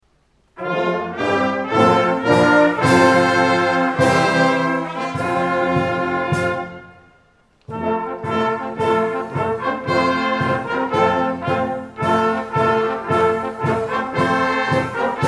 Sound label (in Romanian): Fanfara